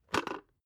water boiler open top

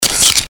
weapon loading

